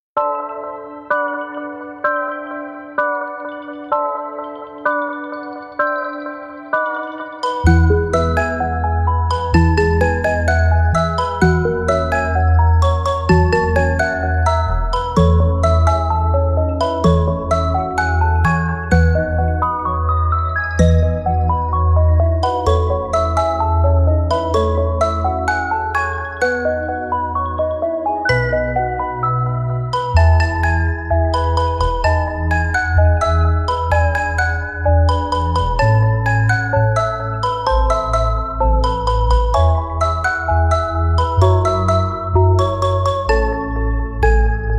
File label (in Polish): dziekuje wersja instrumentalna